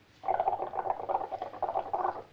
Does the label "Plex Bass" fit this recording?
No